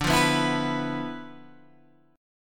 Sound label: D Minor 6th Add 9th D, Guitar, Open G